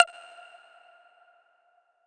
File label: lead tink F; leads